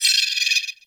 Valley Night Critter; Warframe; The New War; Drifter Camp Valley

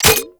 Low Clip; Warframe; Scaldra AK

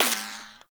Bullet Impact Ricochet; Warframe

